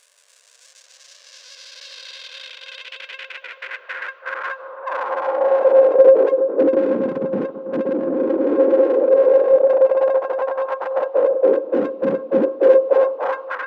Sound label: FX Misc